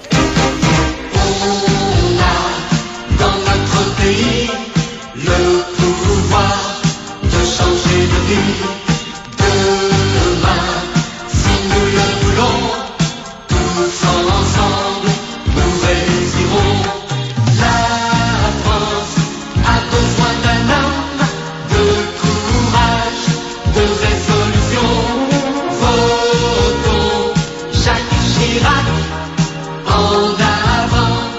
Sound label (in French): Chanson promotion; Chirac